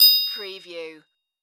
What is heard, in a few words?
Multimedia Bell